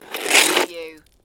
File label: Snow and Ice, Ice scrape slide